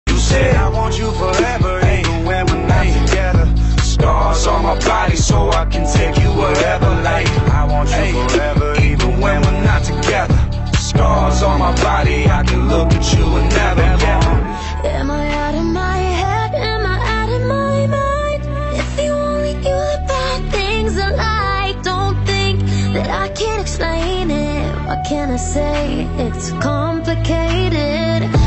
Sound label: English Ringtone